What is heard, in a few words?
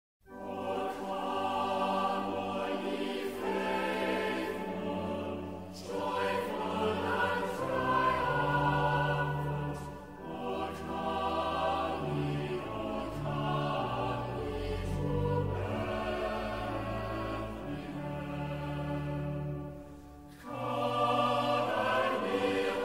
Cathedral Choir, O Come All Ye Faithful